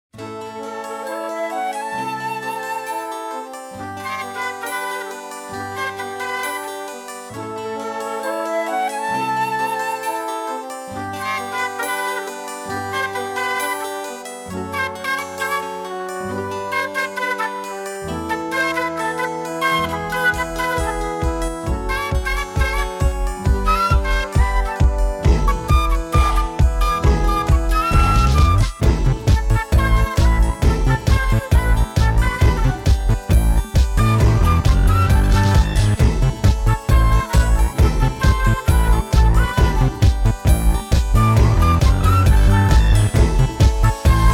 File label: FLUTE